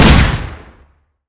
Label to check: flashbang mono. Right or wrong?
Right